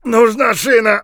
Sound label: bear3 broke hand; Escape from Tarkov